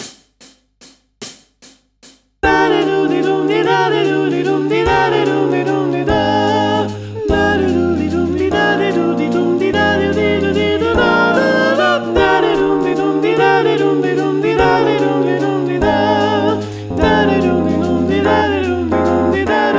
voix all

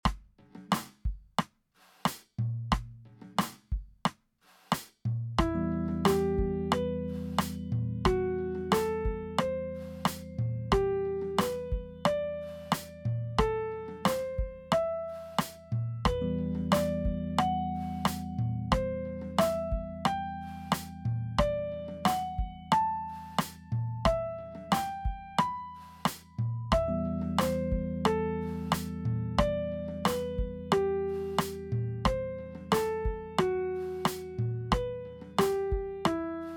exercice de gamme

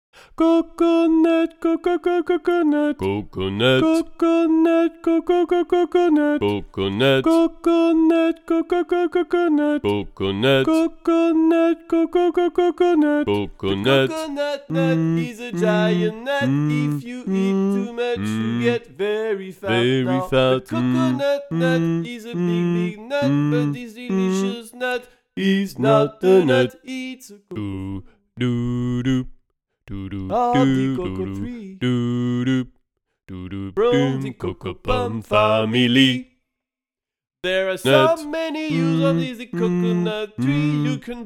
coconut song basse et lead, playback

